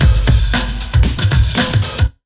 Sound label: ROCK 132, Big Beat Drumloops; AKAI